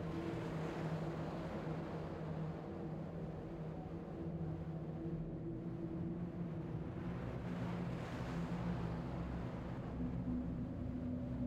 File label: Cliff Drones; Michael Ghelfi; Day of Mourning; shadow